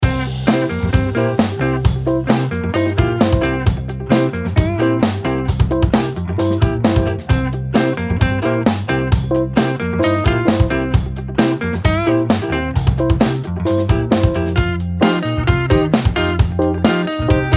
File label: Happy Funk loop